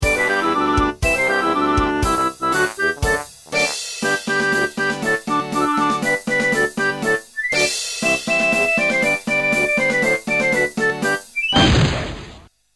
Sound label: Polka; GMathieu